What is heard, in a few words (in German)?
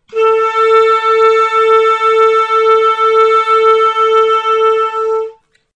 Kammerton 8 Przt Schwebung